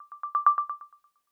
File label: Twinkle; recurringDisclosure; iOS 26